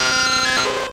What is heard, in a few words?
cry zubat; Missingno